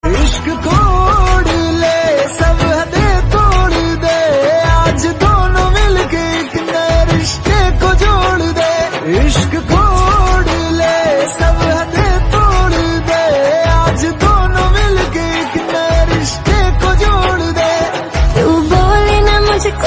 hindi ringtone, bollywood ringtones

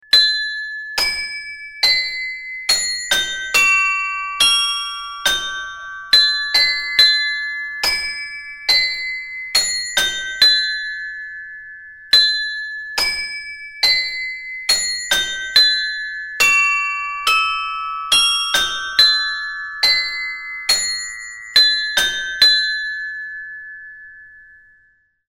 carillon music ringtone

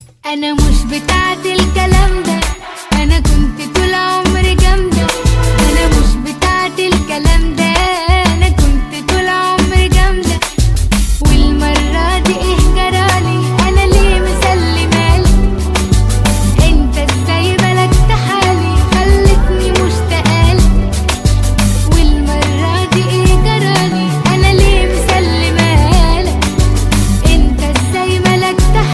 arabic ringtone

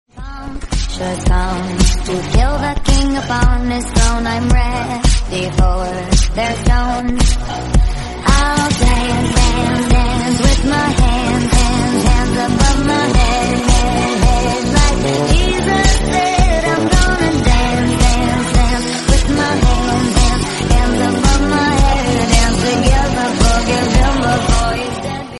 classy english song tone ringtone; english ringtones

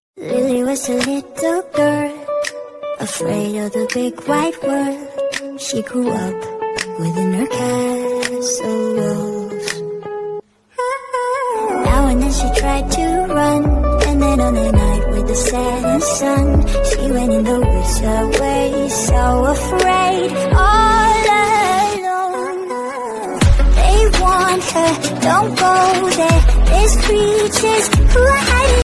english ringtone; english ringtones

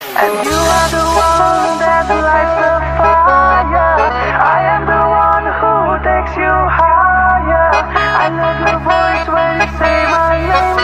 english tone ringtone

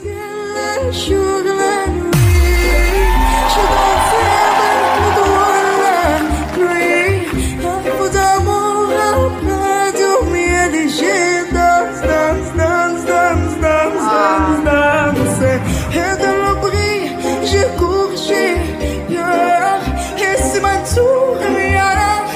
ringtone remix ringtone, english ringtones

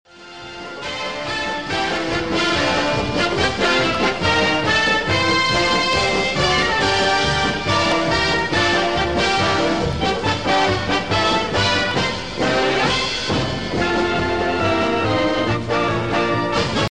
fight song; baltimore colts

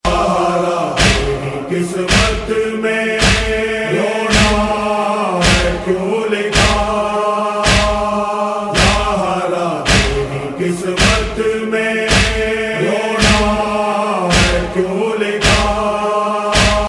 noha ringtone

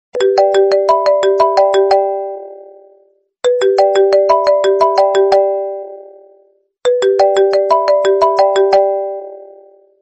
iphone sms ringtone

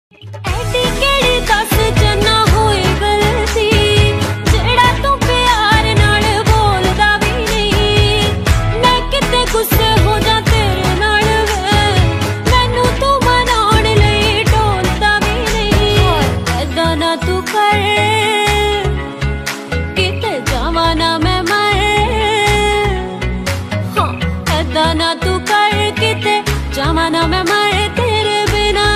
female version ringtone; bollywood

